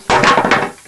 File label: LID DROP